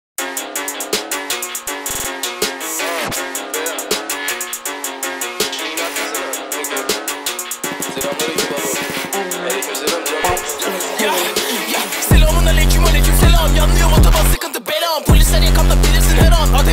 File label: phonk slowed reverb bass